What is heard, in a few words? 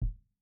KICK LOW, drum; t series drum pack